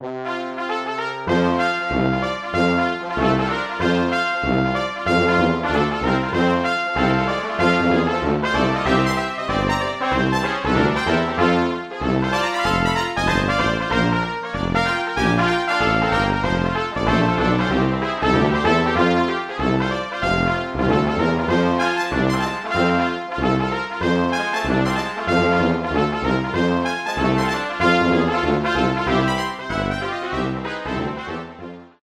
Brass Section Ensemble; Aeternus Brass; Syntheway

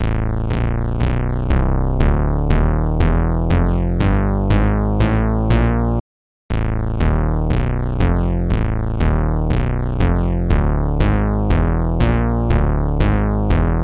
Moog Taurus 3 Bass Pedal; Bassesland; Virtual Acoustic, Electric and Synth Bass KONTAKT Sample Library for NI KONTAKT; Syntheway

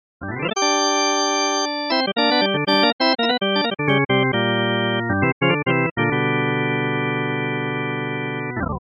Drawbar Organ; Syntheway Harmodion

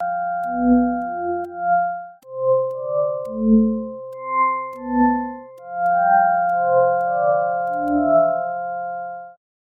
Glass Armonica; Harmodion; Syntheway

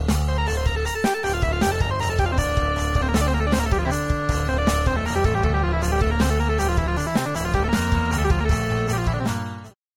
Power Synth; Waverator Spectra; syntheway

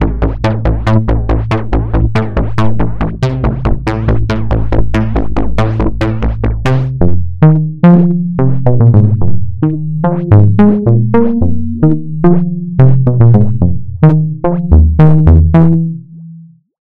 BASS Acid Burble; SpheratorFM; syntheway

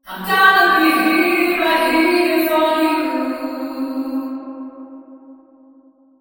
Cathedral Reverb Female Vocals Wet; Echo Chamber; Echo Chamber is a stereo reverb and delay plug-in; Syntheway

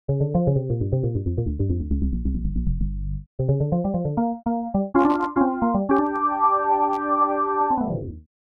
Hammond B3 Blues; Master Hammond B3; Syntheway

